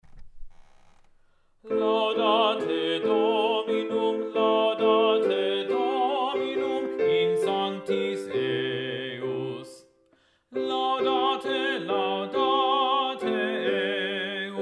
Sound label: Bass; Laudate Dominum, G. Pitoni